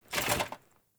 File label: Foley Action Armour Dodge; Characters; Player; Deora Armour